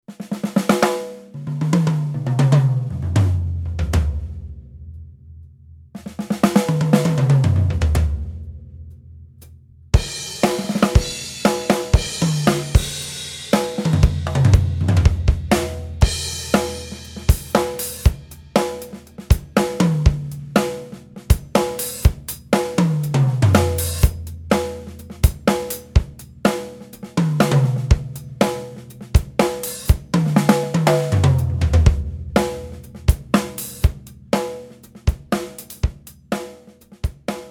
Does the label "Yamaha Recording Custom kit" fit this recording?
Yes